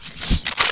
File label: change weapon; Soldat